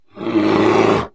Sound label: bear melee